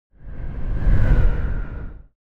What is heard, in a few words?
sci fi vehicle train passing fast short